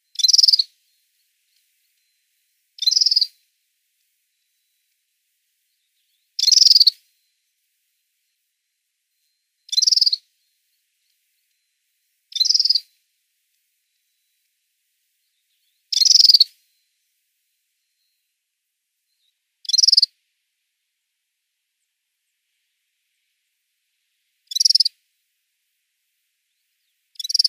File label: Redfronted Serin calls